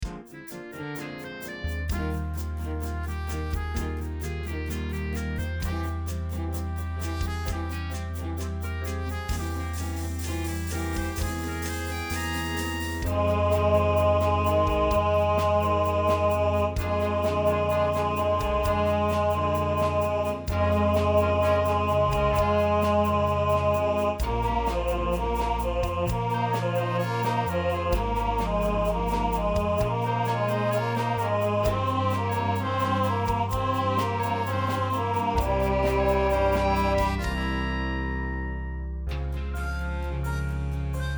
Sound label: Tenor 2nd Part